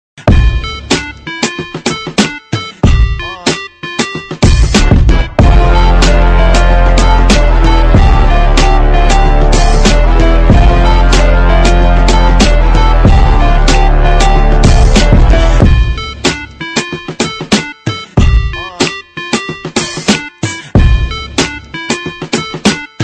Massive Bass Effect

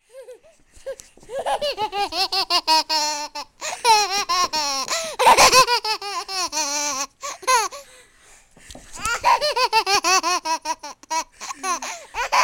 Baby Laugh

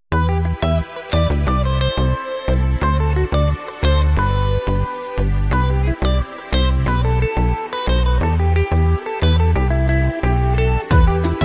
Melody

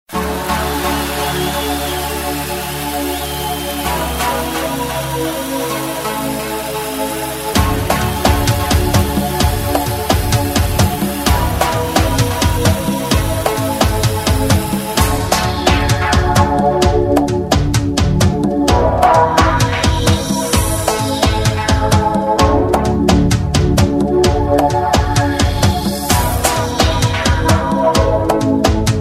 Funk

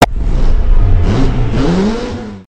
Porsche Engine Start

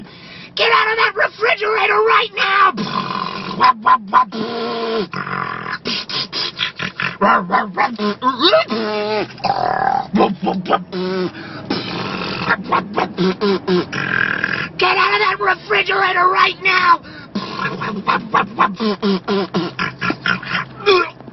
Dubstep

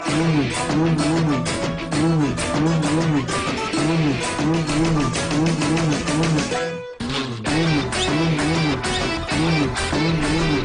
Movie Bgm

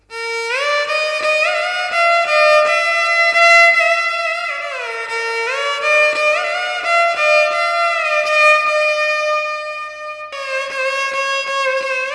Violin - Sentimental

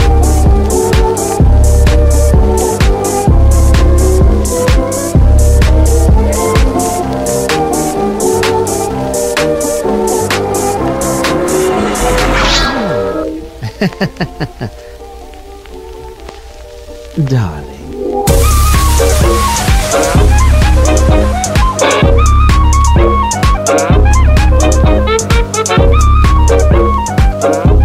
electroSwing

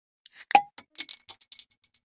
Bottle Cap

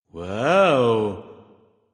Doge WOOoOW; Skadi

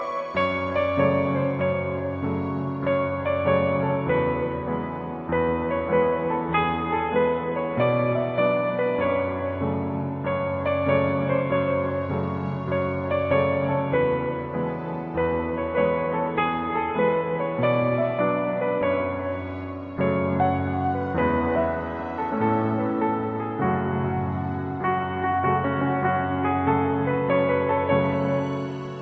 On Piano

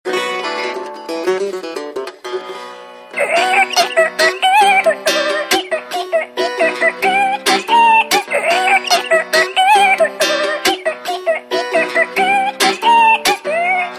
Flamenco Chicken

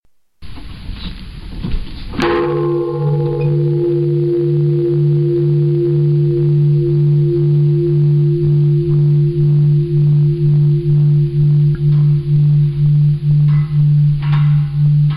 Long Temple Bell

